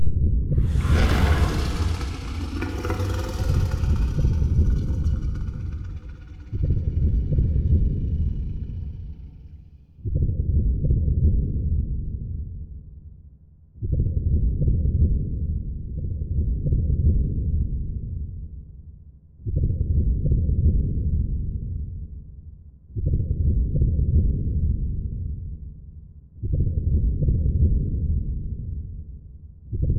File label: aquatics dragon idle